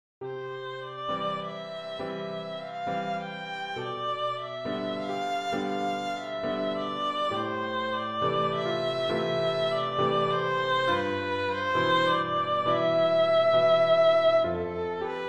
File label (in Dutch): Largo voor viool en piano